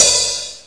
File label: Open Hi Hat; MetroDemo 2.5